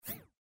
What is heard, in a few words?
woosh stereo